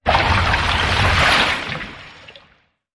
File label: Water Hit; Red Dawn